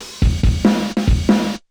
DRUM LOOP BIG BT 140; eJay; BigBeat

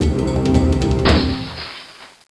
shatter

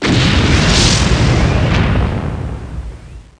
collide; Scientific American Library: Planetarium

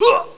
ouch; dactylsp

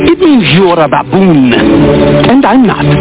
Empty Recycle Bin; Lion King

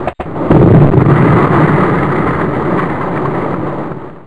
Dull Explosion Close; U-Boat 1.5.3

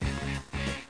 Disco - Minimize; discotheme

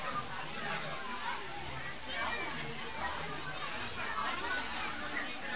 Toy Loop; KidPak

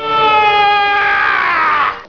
Arrgh!; Tron 2.1

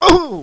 hurt; DOMINA

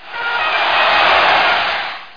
Windows Start; Win95; Fila

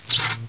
DRONE; Marathon revisited; BAD DRONE 3

